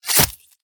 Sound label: flesh, combat weapons; CosmicRage; CosmicRageSounds; chickens and more chickens